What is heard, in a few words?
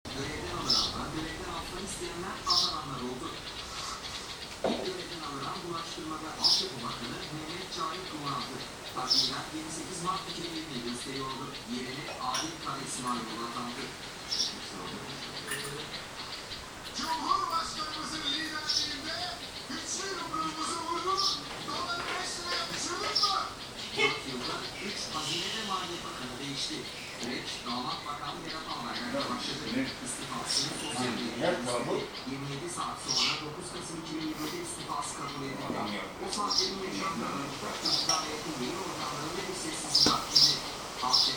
anamur summer home ambience